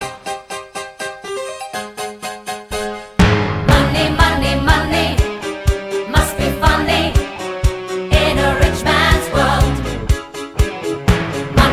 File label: Audition Music